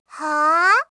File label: haa, reaction, voice